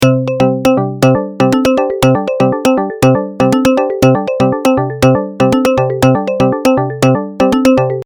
Arpeg Note Echo

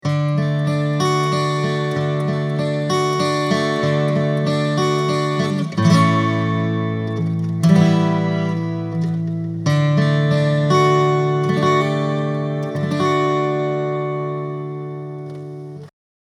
12 string

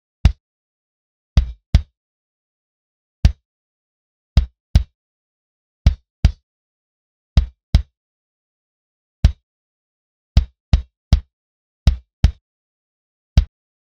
Kick Modern Gate

